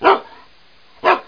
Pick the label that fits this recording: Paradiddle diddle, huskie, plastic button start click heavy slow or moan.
huskie